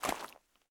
zombie footstep mud; DeadFright

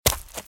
Footsteps - Leaves - Run - Left; RadwinIsland

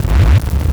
Static; Blastwave

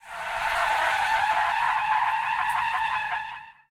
skid asphalt; Blind Drive

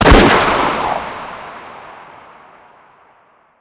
hunter rifle shot reverb; DeadFright

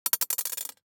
DICE on Glass, Throw and Roll, Mini, 2 Two Dice; Samples; ArticulatedSounds; DICE Sfx Party (Extended)

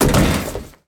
Bodyfall Hollow Metal; SFX Kit; Bodyfalls

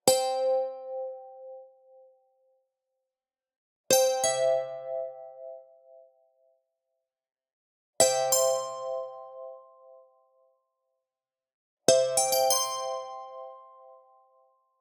Arcade Click Positive

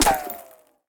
gun miss; Blind Drive

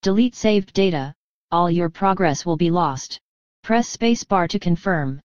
menu; DeadFright

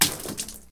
Blood Splat; SFX Kit; Foley - Misc

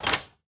door lock; DeadFright

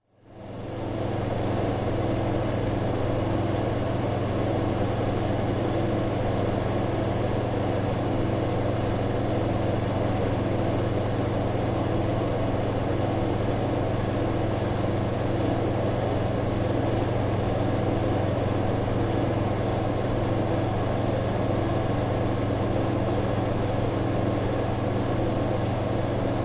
Soft Drink, Fountain, Compressor, Fan; Sound Ideas 2000 Series

